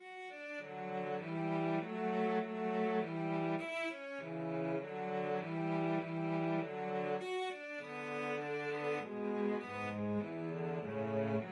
minuet VLC duet, cello duet

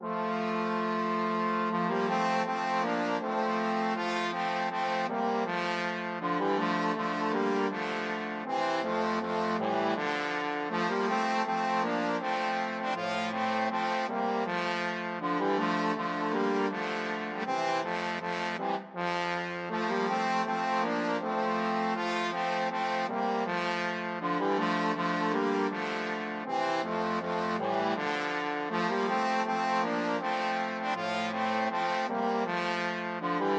slavonic trombone

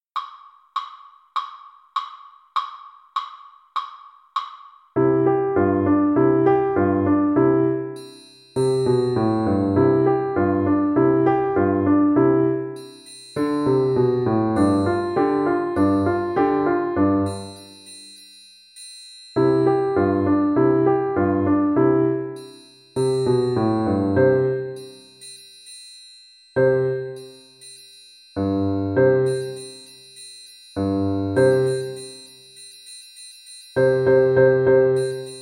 triangle, Percussion

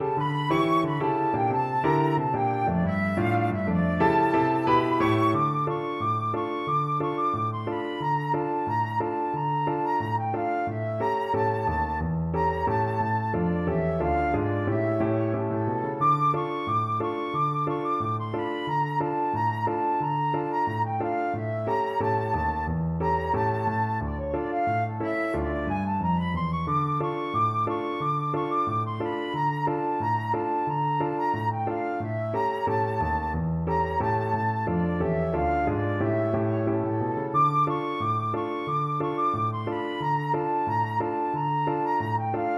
flute

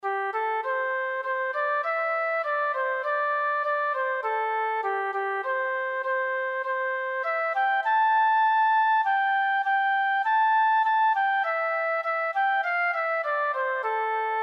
tin whistle

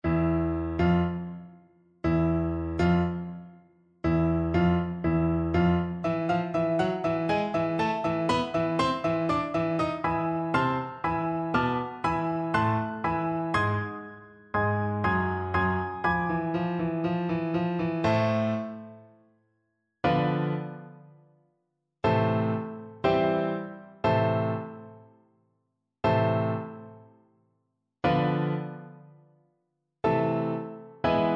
Oboe

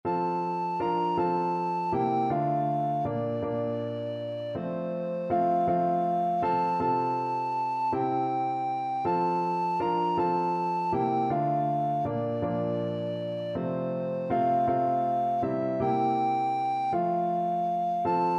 jesus keep me near, alto recorder

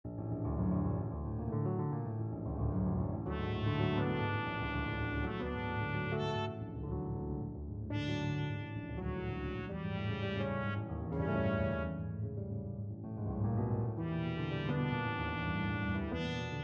la valse trumpet

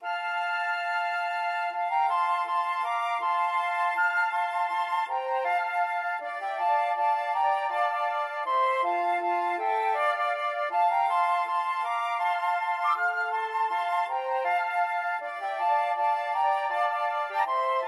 slavonic, flute

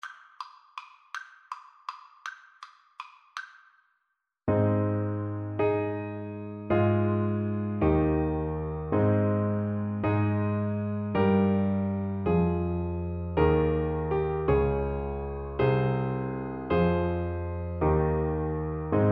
Clarinet

